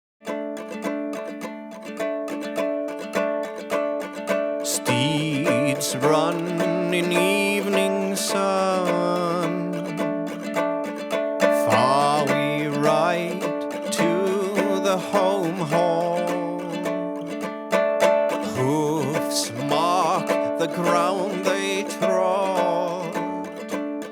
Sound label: kitara+vocal+drum